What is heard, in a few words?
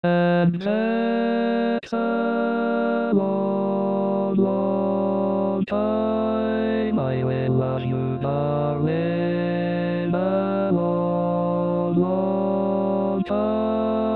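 lower Baritone, barbershop tags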